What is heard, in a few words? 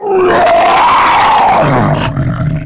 Godzilla Roar